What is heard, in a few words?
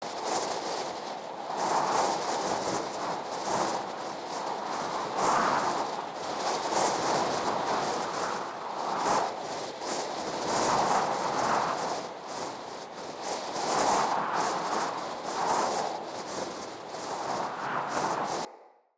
Generic Wind Loop Fast; Vehicles; Archwing; Eidolon